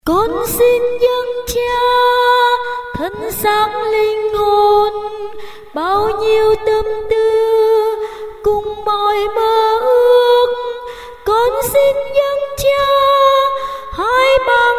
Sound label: tenor basso